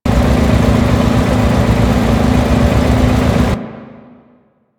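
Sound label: Settlement background machine loop; Ambience; Warframe; Grineer Settlement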